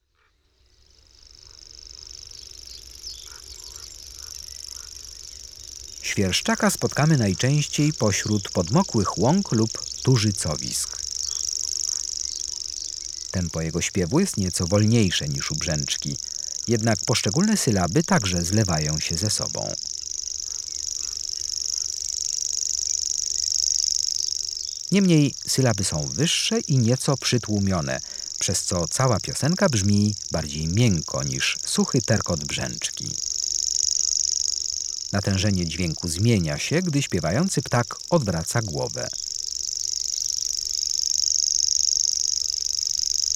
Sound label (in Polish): Swierszczak, Ptaki; Kurs PTTK; Przyroda